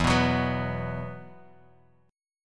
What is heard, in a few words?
E Suspended 2nd E, down, Bass Standard; Esus2 strummed